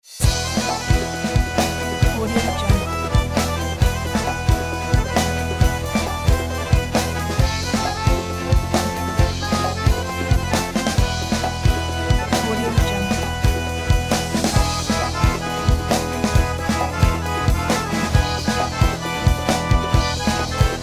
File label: east hello